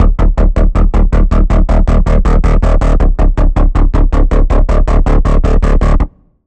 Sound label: Pulse, Serum 2; Pulse - Ready To Rise; Routed Sound; Serum 2 Expansion - Quantum Enforcer Vol.1